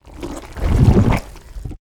squish

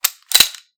gun slide lock

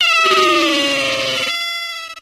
hiss

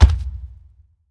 step, alien

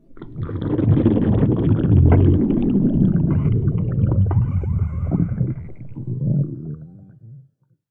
death; vore; Prey

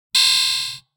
error, computer; GS13; nsv13

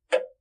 Tock; Spookystation

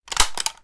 saw open, weapons

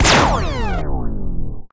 Particle Blaster

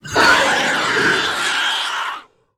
screech, alien voice

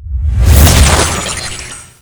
Disable Tech; Aurora.3

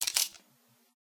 baton extend

